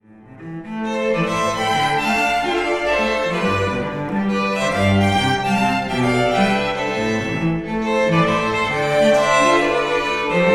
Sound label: String Quartet